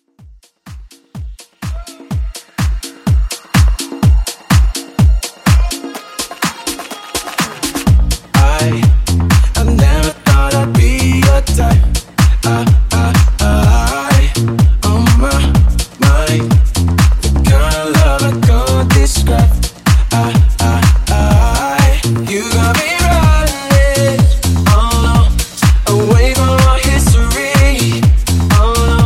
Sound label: Top40 Tech House; Mashup